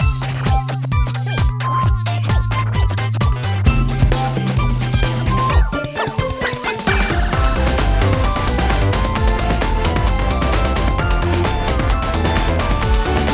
BGM

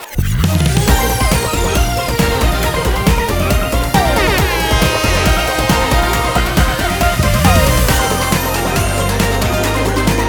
BGM ENTRY

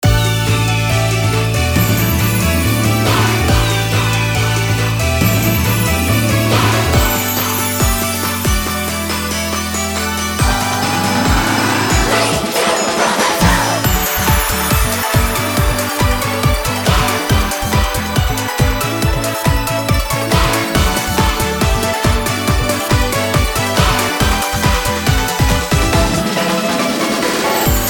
BGM ENTRY

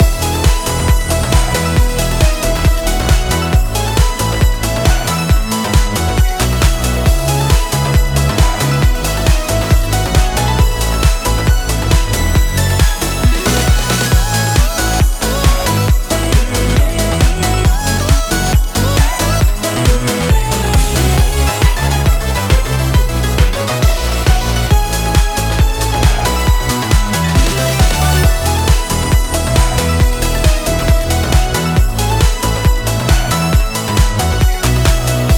BGM RESULT CLEAR

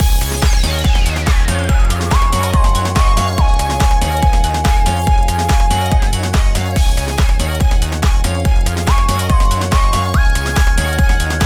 BGM RESULT

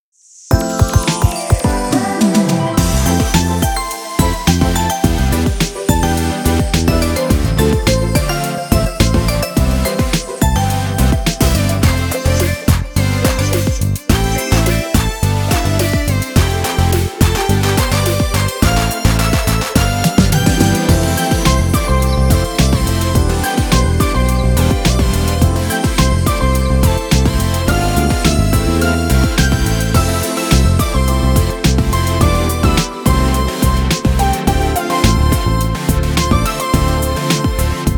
BGM COLLECTION

